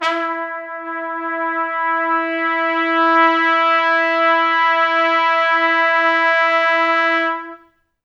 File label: E3, Pop Brass; Best Service; ProSamples vol.19; AKAI